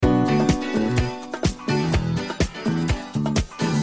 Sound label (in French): Majeur ou mineur B min